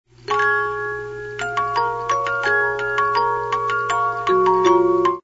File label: La Fille Aux Cheveux De Lin, 50 Note music box